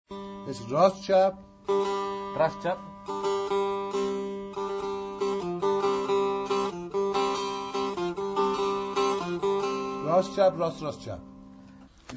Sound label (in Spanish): chahar mezrab ritmo; Musica persa; homayun